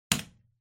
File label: Light Switch Wide Panel, Wall Hard